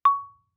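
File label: Marimba cord fortissimo Db2; MIS Pitches - 2014; Percussion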